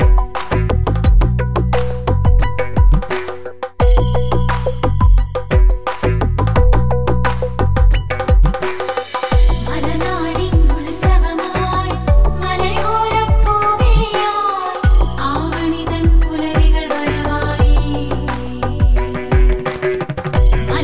onam festival malayalam ringtone

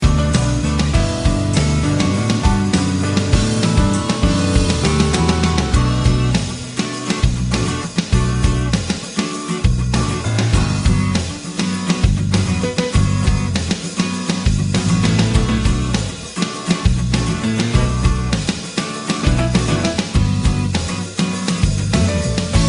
cyclone effect ringtone

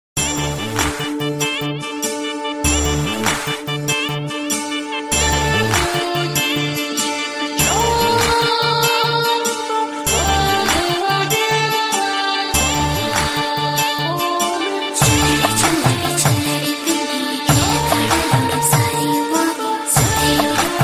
new apple tone ringtone

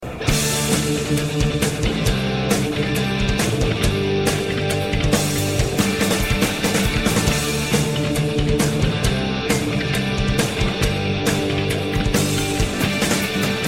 heavy metal ringtone

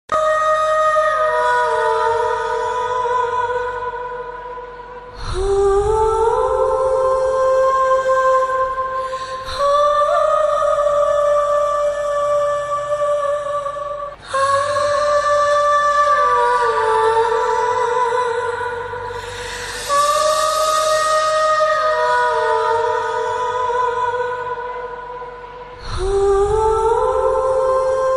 sad music ringtone

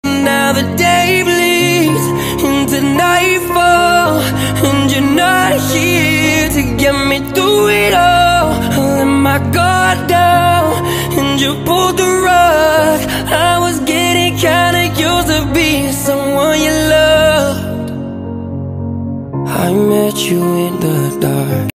status ringtone, english ringtones

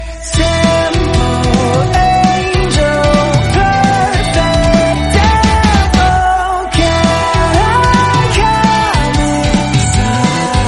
party dance musical english song tone ringtone; english ringtones

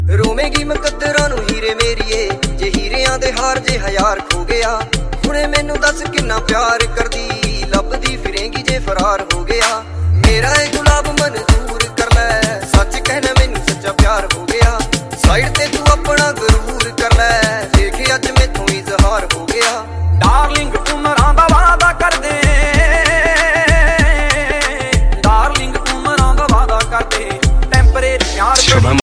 dhol ringtone

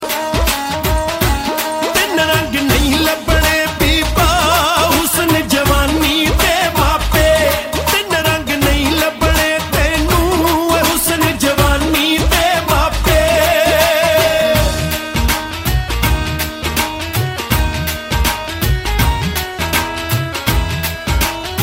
tin rang punjabi ringtone

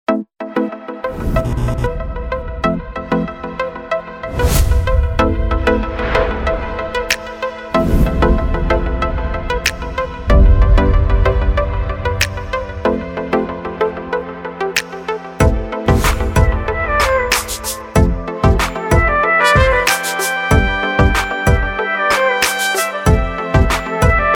cool background instrumental ringtone

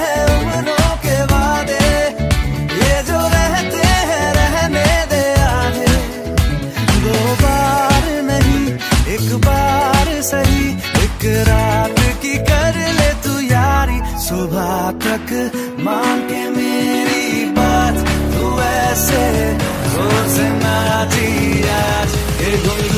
top sweet bollywood ringtone

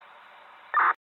moto g 2nd gen ringtone

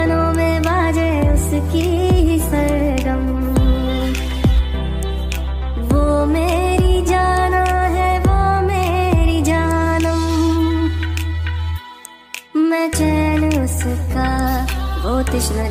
trending bollywood ringtone

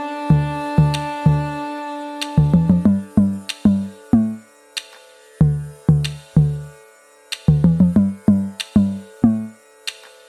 carnatic mix ringtone

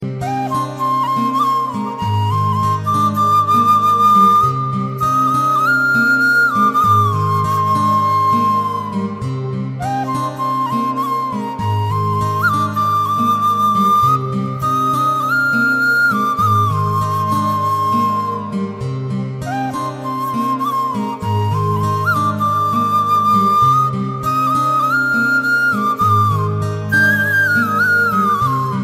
flute ringtone, bollywood ringtones

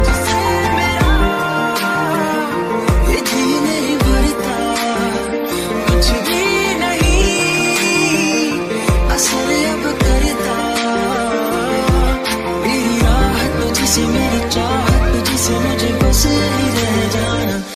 love sad ringtone; bollywood ringtones

